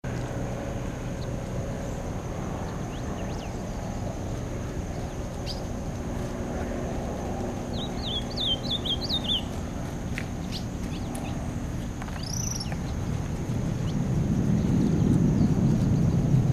reinamora grande canto

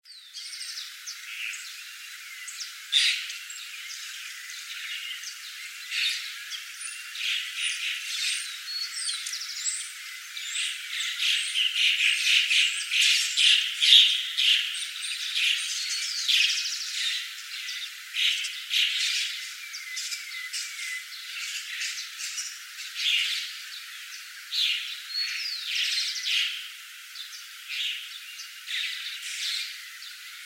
pardas

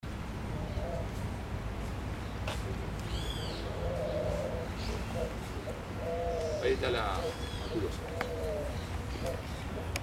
paloma manchada

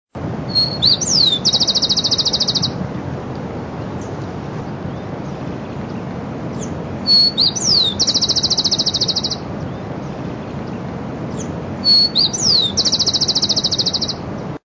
voz chingolo

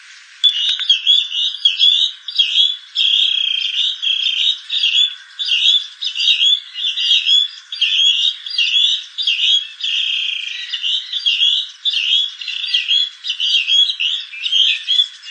voz Gallineta común juvenil llamando a adulto; pardirallus sanguinolentus

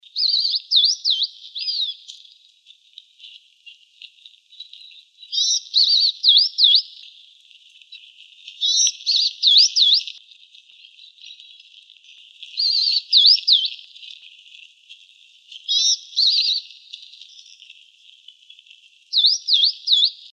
voz tacuarita

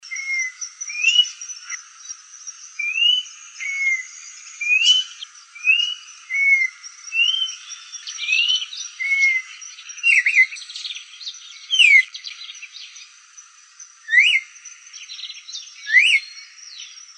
voz matico dos individuos un cardenal

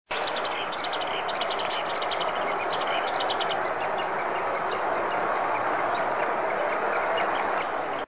voz chorlito de collar

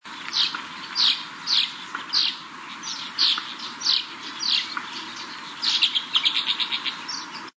voz golondrina parda

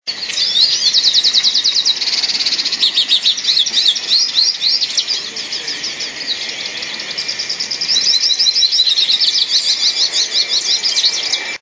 voz cabecitanegra común, cabecitanegra comun carduelis magellanica

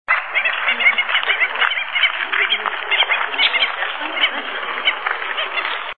tero real bandada, voz

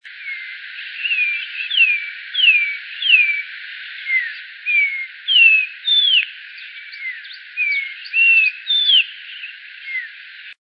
voz matico

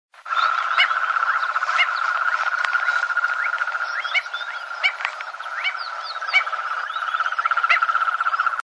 tero real con ranas, voz

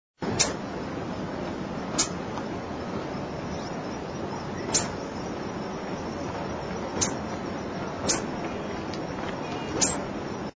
mont cabeza negra

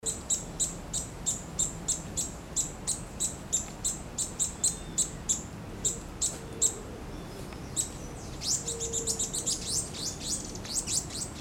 arañero coronado chico

